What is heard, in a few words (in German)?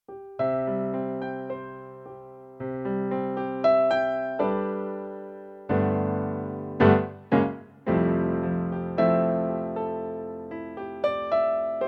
Klavier